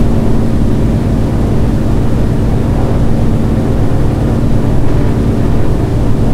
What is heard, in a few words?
c46 cockpit l, engines; War Thunder